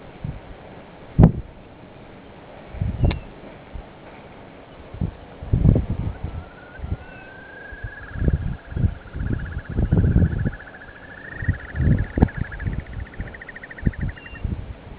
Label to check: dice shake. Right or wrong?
Wrong